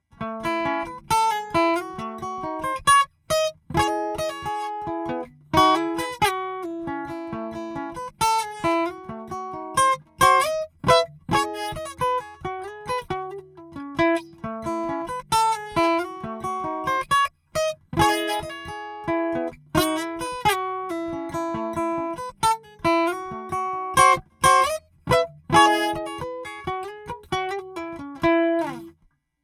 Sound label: Taylor 814 Shure SM57, guitar